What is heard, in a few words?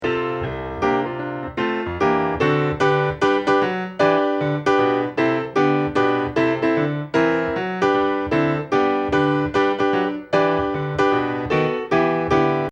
accomp; good news chariots a-comin